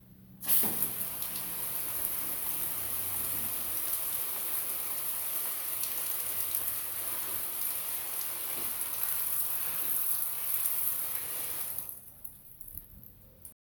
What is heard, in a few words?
Watering Plants